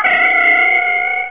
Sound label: Beaming; amiga; DiamChall